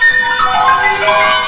Clackety

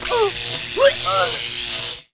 shock; BESTSHOT; noisybit

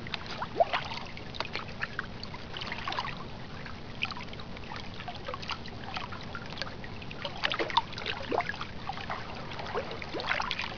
WATERMIX

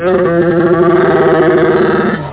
baa; mini tiles

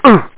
hurt

